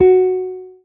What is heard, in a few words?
marimba guitar; ゆめ2っき